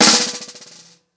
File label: Snare Trem, drums singles; The Roots Drumloops